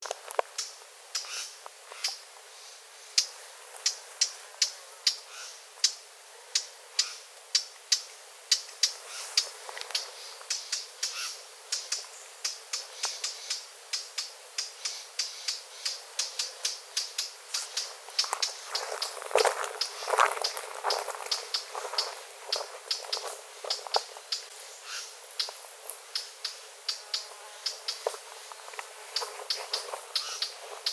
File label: Sylvia atricapilla call alarm; passeriformes; sylviidae; birds of the ussr